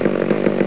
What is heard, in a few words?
saw idle; ParoxysmII; weapons